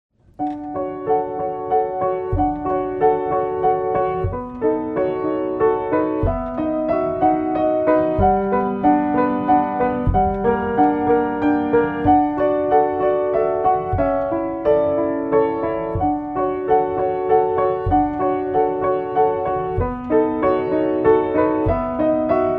piano; Undertale

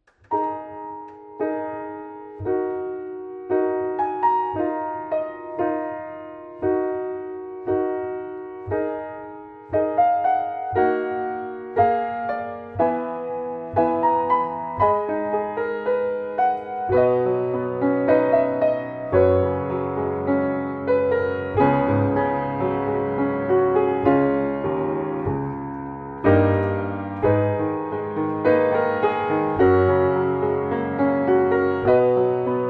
piano; Valkyria Chronicles

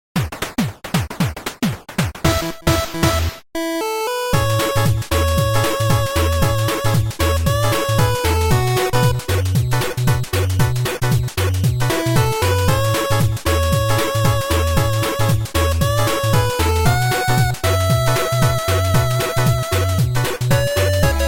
8-Bit Chiptune Cover; Hole in One - Rhythm Heaven Fever; dance

